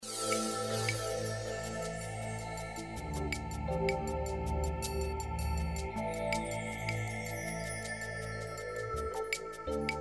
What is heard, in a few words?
dance mix